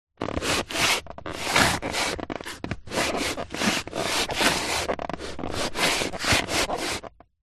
vehicles car driver side feet shoes movement scuff carpet floor pedals creak; toyota rav4; zapsplat